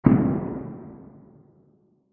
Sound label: High Diffuse Explosion; Blasts; Impacts; Crafted Signals